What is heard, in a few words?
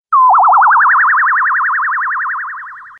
Night Owl